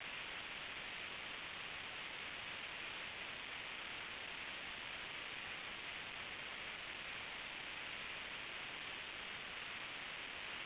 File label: white sound masker